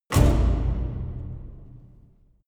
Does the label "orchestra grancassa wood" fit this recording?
Yes